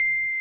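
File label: glockenspiel C5, percussion; AKAI S-Series CD-ROM Sound Library VOL-8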